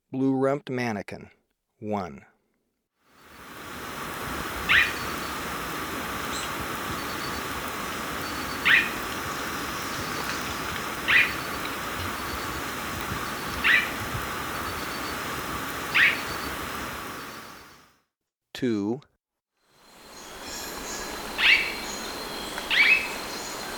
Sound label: Blue-rumped Manakin, Birds of Ecuador; S. America